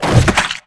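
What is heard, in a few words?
spider dead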